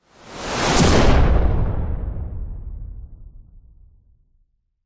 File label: explosion powerful whoosh into med large boom